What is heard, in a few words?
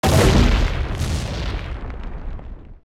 Ganons Castle Collapse